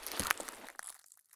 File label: prone glass; Escape from Tarkov